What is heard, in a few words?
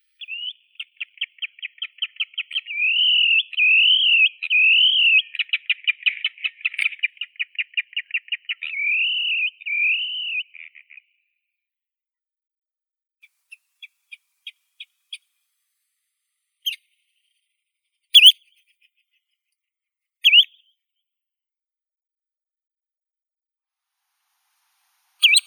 shegol tringa erythropus; zvuki prirodi